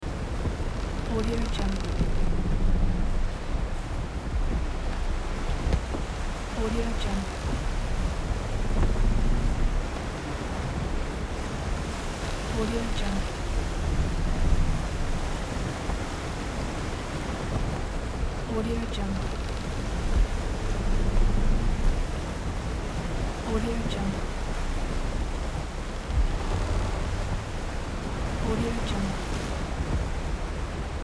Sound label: ship sailing loop